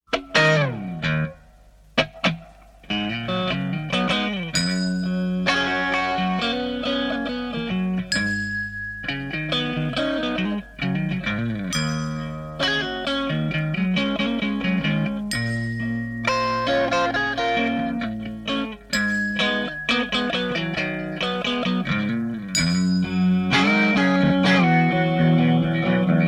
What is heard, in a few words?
leslie